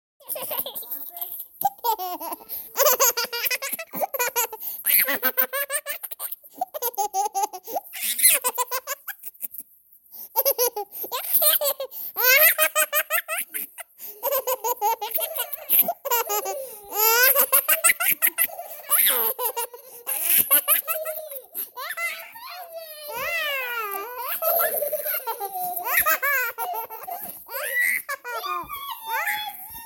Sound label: Giggle